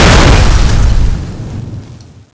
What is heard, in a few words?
bazooka car explode